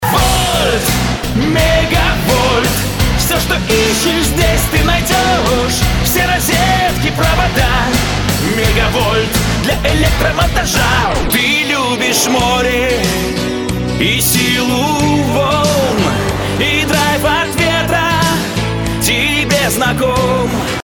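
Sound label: voc rock, Russian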